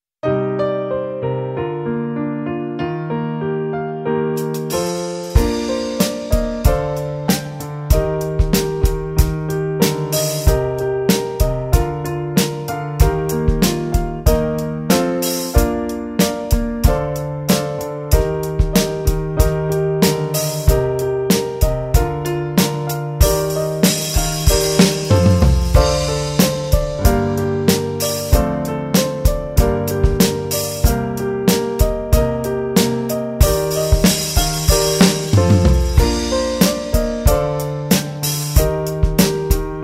F Horn

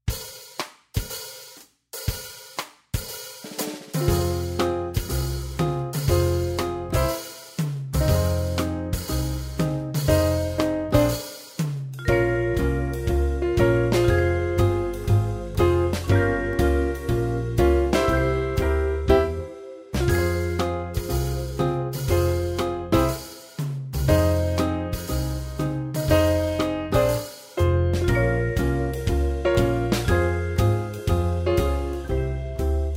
Jazz Hn Eb

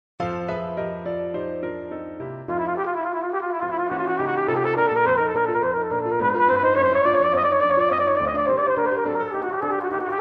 Arban Studies

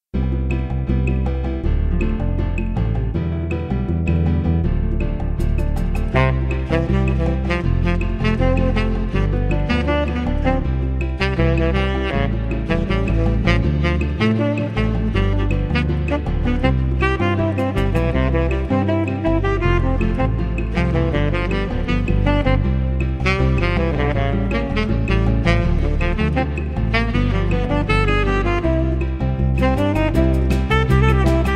Modal Workout

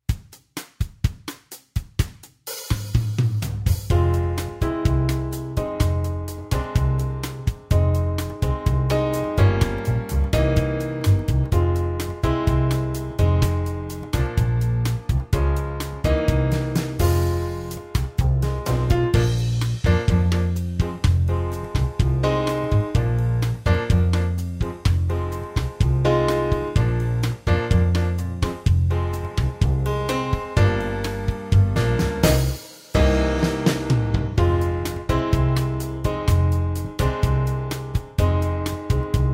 Jazz Tpt

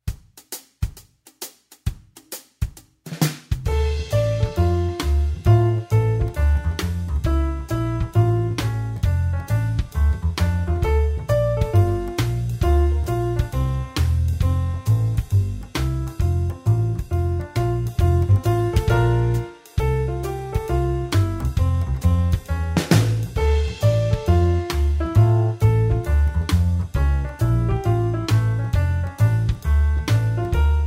Jazz Tbn

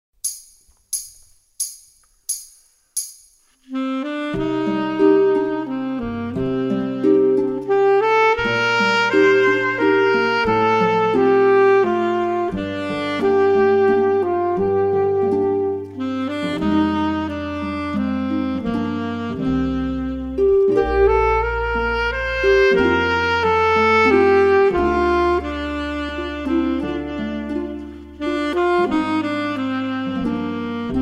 Sax

